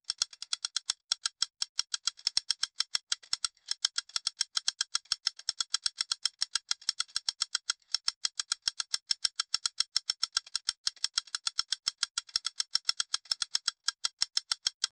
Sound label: robot idle faint servo